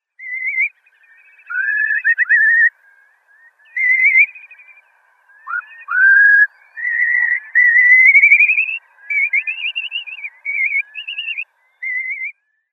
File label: Slender-billed Curlew calls Morocco